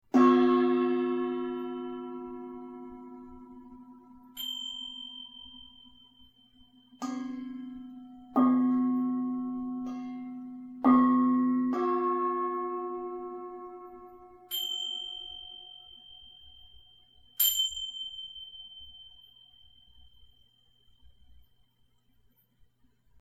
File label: gong tingsha